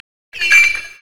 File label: brazier chains